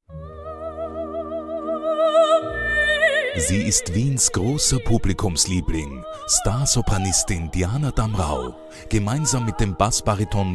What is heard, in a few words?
Great Voices